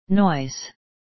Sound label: noise